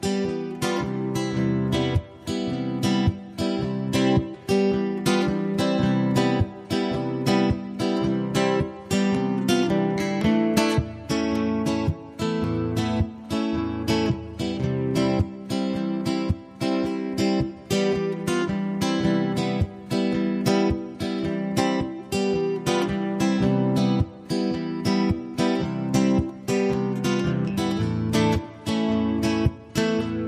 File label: Riff Latino